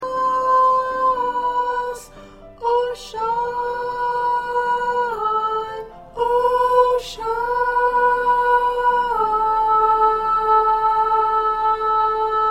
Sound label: 2nd Soprano